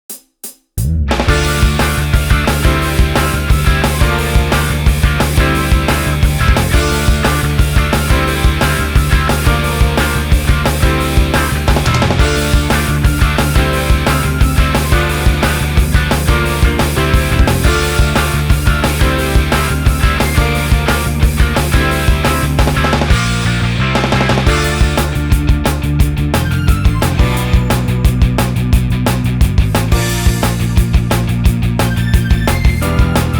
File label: instrumental